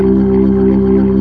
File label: B3 C 1-4, LES